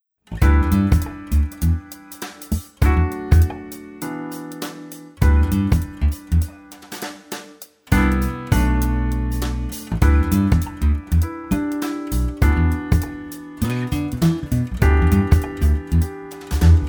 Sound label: Jazz G